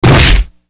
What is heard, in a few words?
Grineer Body Check; Warframe